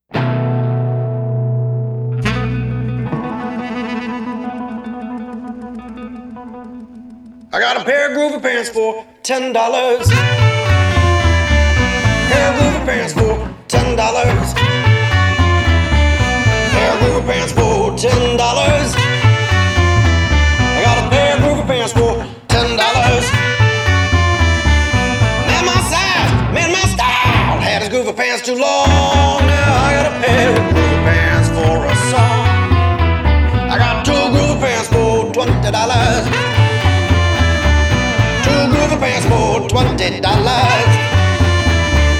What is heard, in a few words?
Thrift Store Blues